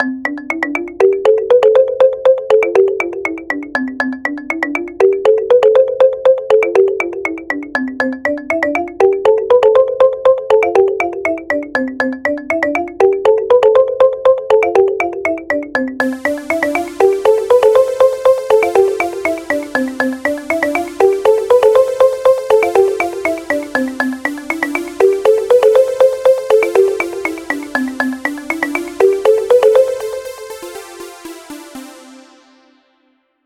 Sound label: Simple Melodic Ringtone